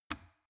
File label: plastic water boiler turn switch on hard